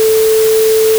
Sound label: Noise A4 44k